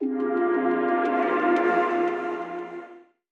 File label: Sting